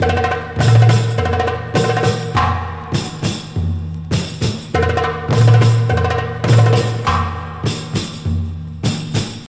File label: 101 bpm drum groove f key